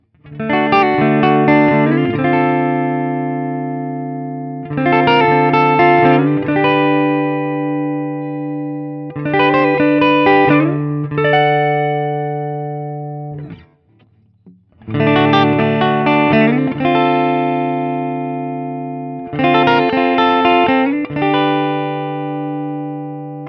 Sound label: Guild S300AD, D-Shape; sunburst Guild S300AD guitar; JCM-800